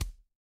impact punch

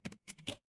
ui modal

